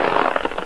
rope

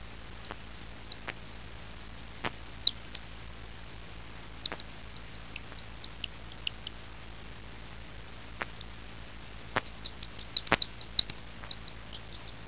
bat, ambience

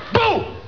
boo

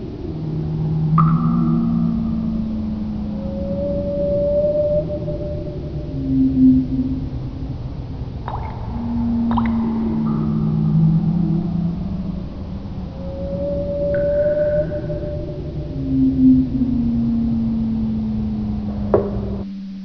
canyon drips

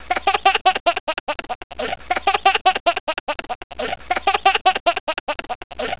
baby laugh

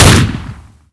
gun

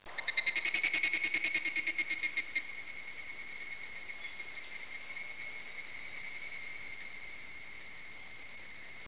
cicada

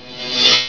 crate hurt

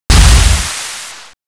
flame burst

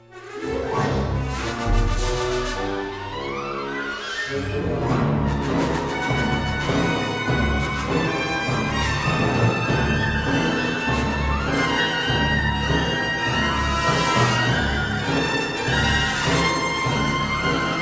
trex music

